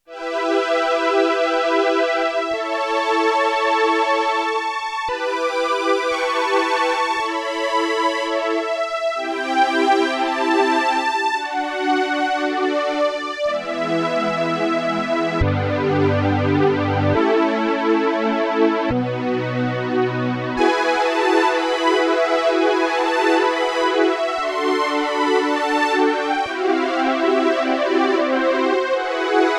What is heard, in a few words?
Hi Strings, Roland JX-8P